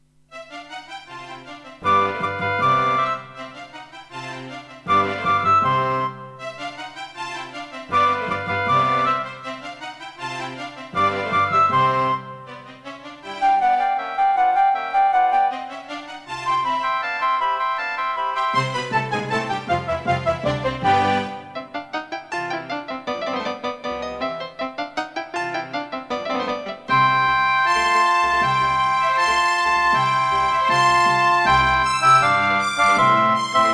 Mozart - Concerto K467 partial, EMU SoundEngine

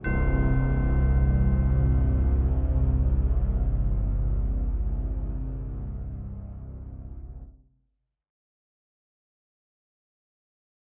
Intro, Casio CTK-800; Dark Forces

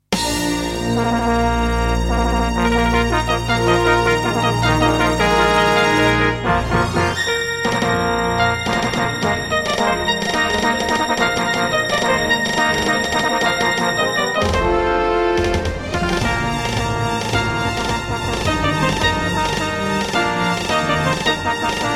Intro, Terratec Wave XTable; Dark Forces

